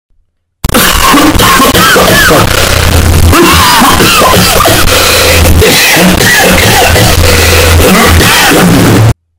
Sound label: Coughing Sound EARRAPE